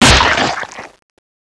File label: Cocoon Pain; Scenario; Npc; Angra